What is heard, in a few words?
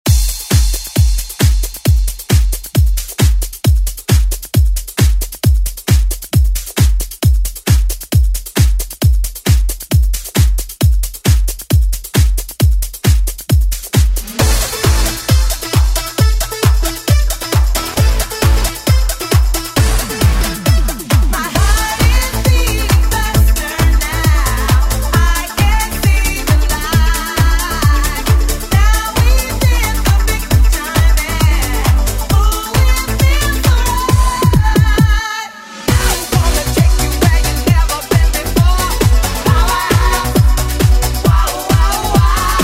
90s ReDrum